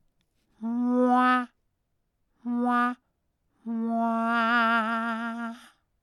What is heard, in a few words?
Fail Jingle